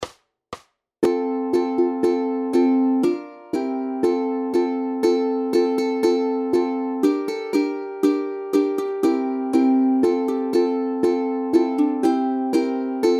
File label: UKE; ja su synek z Polanky; ukulele